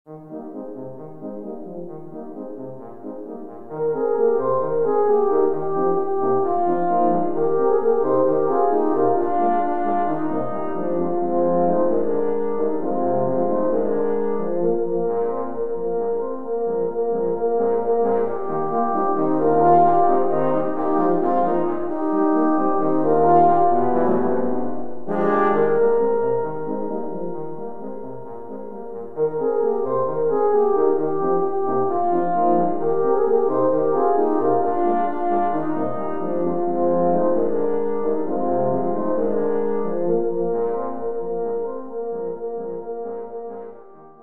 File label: horn quartet